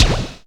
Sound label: Strange Kicks, Percussion; Dance Synths